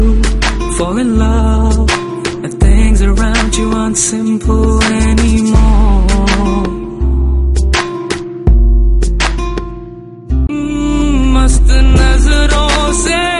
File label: Panjabi